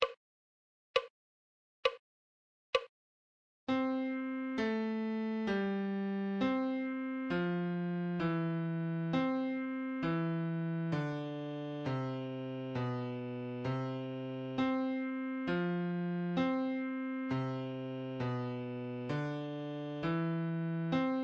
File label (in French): Clé de Fa Adagio